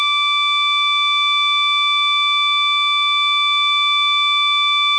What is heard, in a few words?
tone 500 continuous